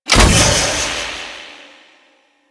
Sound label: Seismic Disruptor B; Warframe; Ambience; Grineer Asteroid